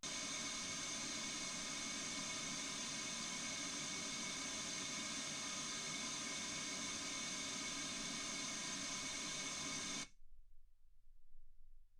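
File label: white gaussian noise 48kHz; single mic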